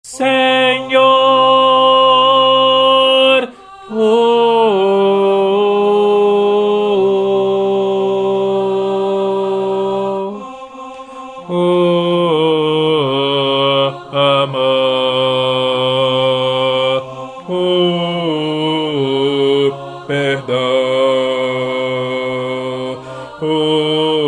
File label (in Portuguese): oracao a sao francisco tenor